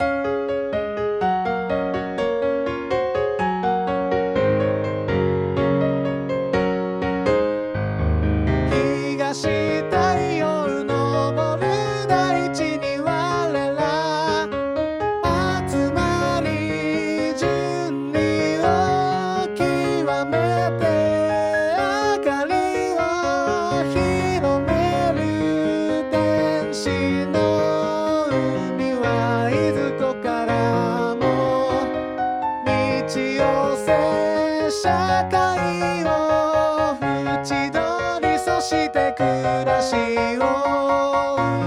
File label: corporate song